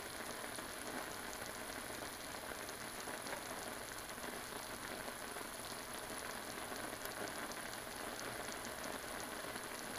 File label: Simmering Pot